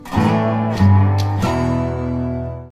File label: Firefly Western Tone